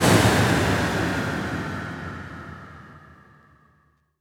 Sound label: Downsweeps